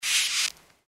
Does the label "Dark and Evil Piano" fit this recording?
No